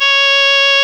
REED C#; Big Fish Audio; Synclav FM

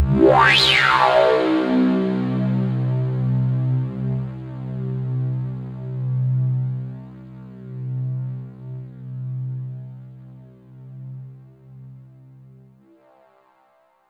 C2, Synth Line; 90 s Sample CDs; NiceBeats; SynthLine VOL-18

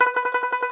Tenor Banjo, Plucked Strings

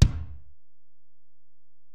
Ac BD, Alesis DM-PRO; 300 Drum Machines

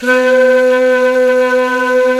Flute, Singin' Flute

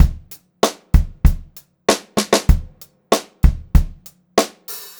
BEAT, Pop-Rock Drumloops

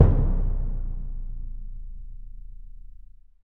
PRC CBD, Orch BD Roll; Roland LCDP03 Orchestral Perc; Orch Bs Drum

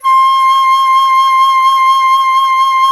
FLT FLTC, Flute; Miroslav Vitous - Solo Instruments VOL-2

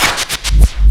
SHAKE SCRATCH; E-MU Formula 4000 Series Vol. 1; Hip Hop Nation

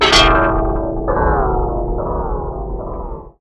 Moog LPF & Delay, contact mic, Wire & Tin; musicradar; contact mic madness

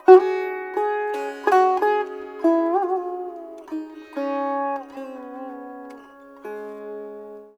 SITAR; Spectrasonics; Heart of Asia

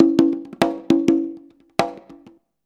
CONGA, Modern Percussion Loops

